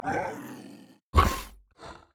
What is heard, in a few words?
wolf bored; LoL; monsters